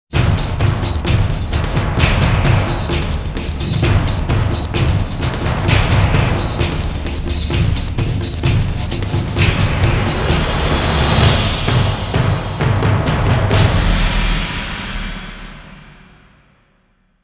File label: JINGLE; Ikkitousen - Dragon Destiny Soundtrack Special Web Radio CD